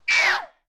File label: harpie parried; enemies